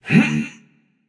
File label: grunt lift, voicepack; smite; jorm